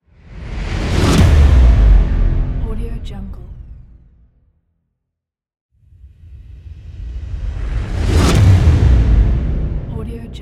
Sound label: cinematic rise and hit